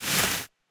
gambler enter; Hot Party Deluxe; Wazdan

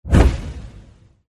character disappear; Journey To West

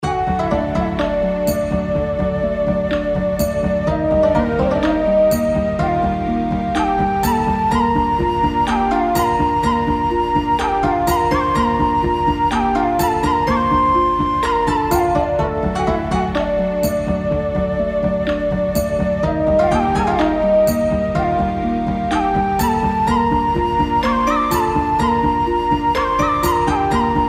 lotus lamp bgm; slot game

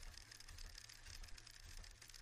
reel spin; Fishing For Gold

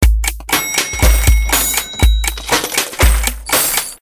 WAIT USER CHOICE; Fruits Royals

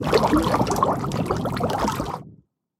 gambling transition fish; Hungry Shark; Wazdan

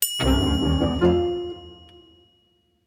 gambling level up; Hungry Shark; Wazdan

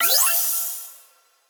gambling win; Lucky Queen; Wazdan

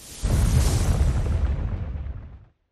bonus wheel dissappear; Wild Ape

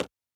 line on hover; Wild Guns

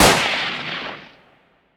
tornado; Wild Guns

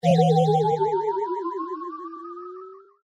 dizzy; Farm Mania; Happy Farm

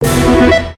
bonus chance; Grand Tiger

